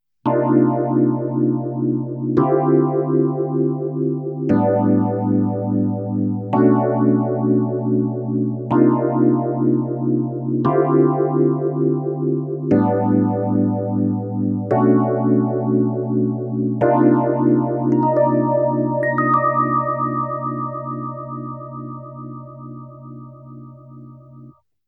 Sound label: mutron Ph